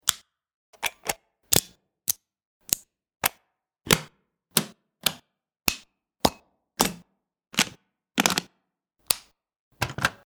Switches And Clicks, Various household switches and clicks pack; Objects